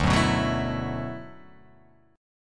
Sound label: D 6th Add 9th D